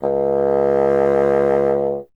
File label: WND BSN C#, Bassoon; Miroslav Vitous - Solo Instruments VOL-1